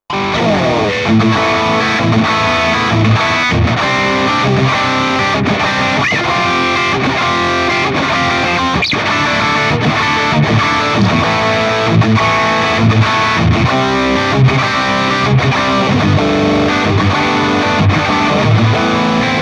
guitar Chords; Solar

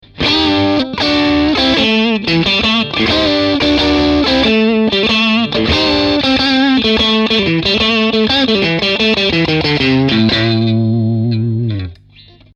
Strat Am Pro Dist medio

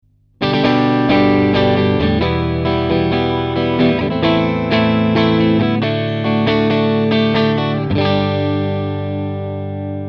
Strat Am Pro strum medio

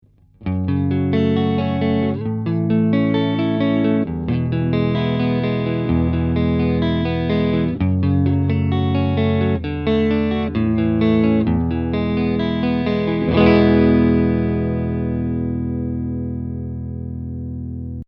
Strat Am Pro arpegio mastil

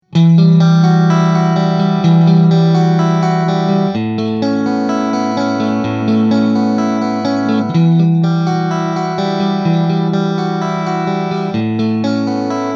Clean Neck Mid

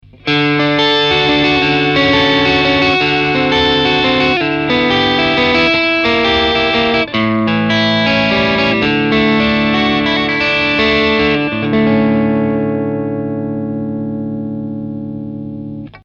Strat Am Pro Overdrive mastil

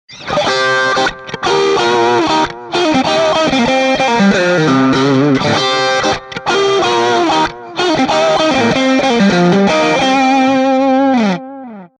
Licks Mid Bridge

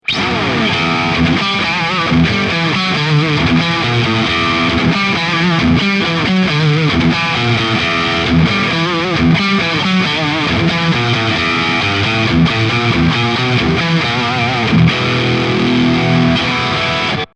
Metal Mid

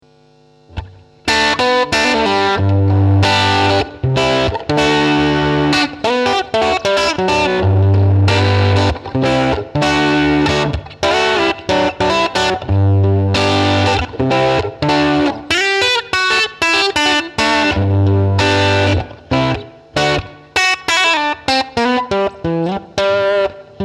US Small Tweed Pop

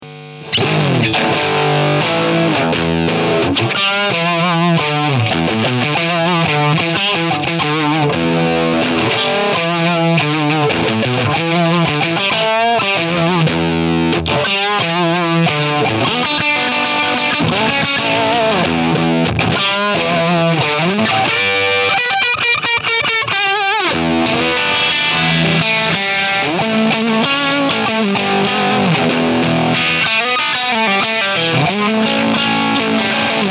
Plexi Rock

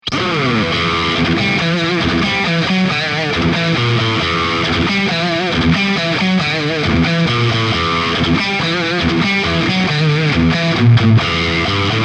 Metal Mid Bridge

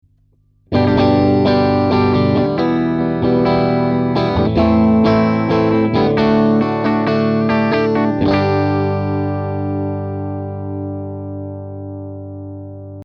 Strat Am Pro strum puente medio

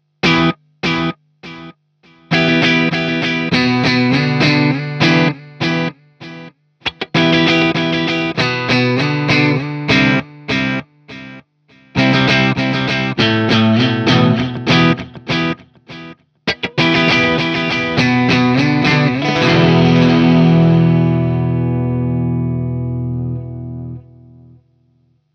hx Simple Delay

